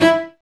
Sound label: STR Vc Attack; Roland L-CD702; Vc Marc&Harm